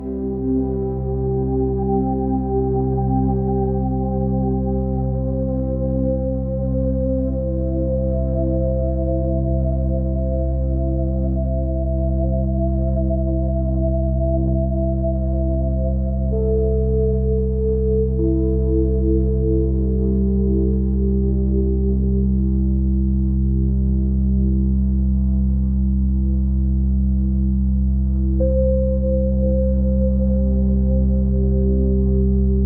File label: Office Calm